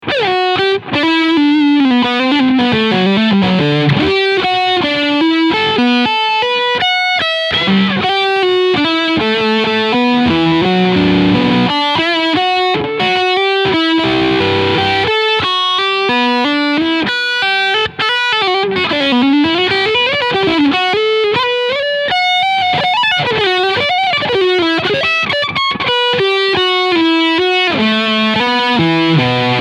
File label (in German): Maybach Lester Wild Cherry 59 aged Leadsound Steg Pickup